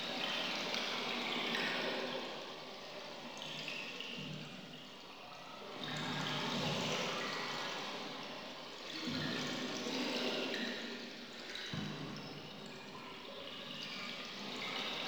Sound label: Lair Liquid Flowing Loop; Warframe; Ambience; Sentient